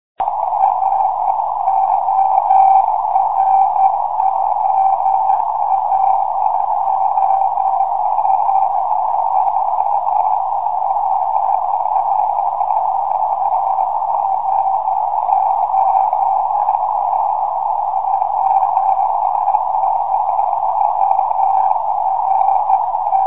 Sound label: 2m EME; OZ1HNE